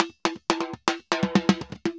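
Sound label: TIMBA SALSA, salsa drums and percussion; Best Service ProSamples vol.14; AKAI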